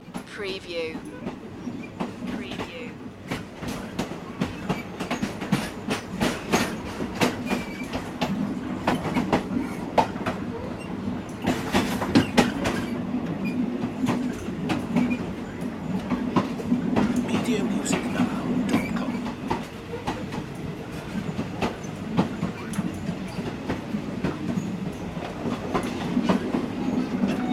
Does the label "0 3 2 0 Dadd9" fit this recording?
No